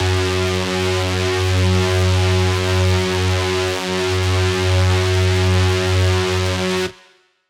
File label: Riddim Melodic Hit F#; One-Shots; Melodic Hits; Re-Space; Ghosthack; Riddim Essentials Volume 2